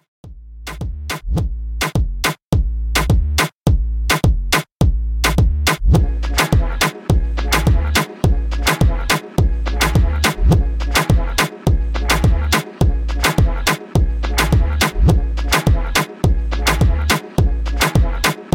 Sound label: Latin Moombah, 105Bpm